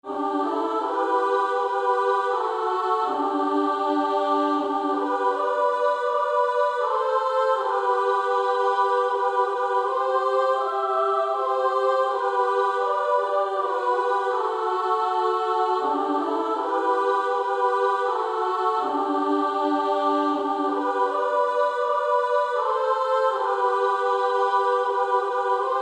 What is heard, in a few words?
Female Choir SA